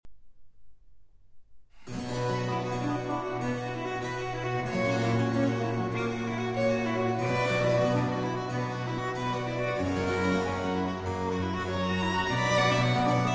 Sonata For 2 Violins & Continuo in B Minor I. Largo